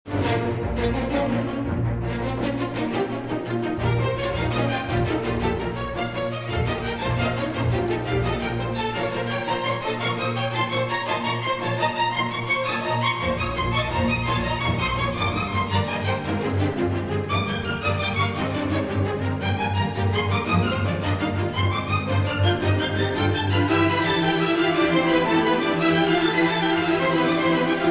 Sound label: string